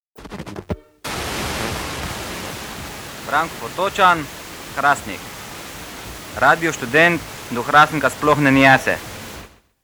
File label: jingle; rs